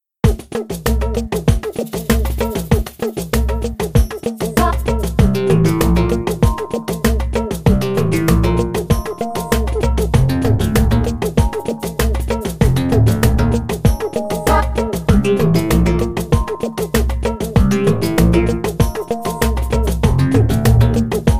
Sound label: Underground; NSMBWii; Yoshi